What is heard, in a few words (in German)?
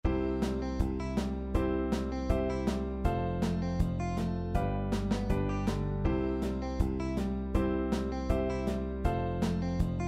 Bandpattern Folk; Popularmusik